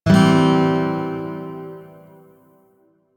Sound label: Shawzin Pent Maj Chord F; Music; DE; Warframe